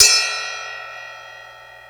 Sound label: Bell One-Shot, cymbal; KJ Sawka; Drum n Bass & Beyond; Drum Tool Box